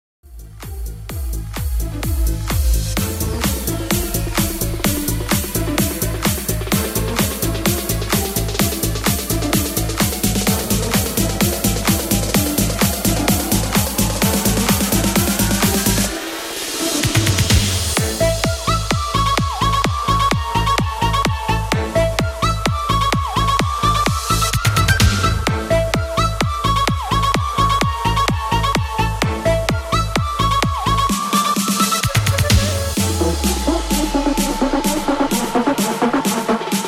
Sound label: Dancehall 128 BPM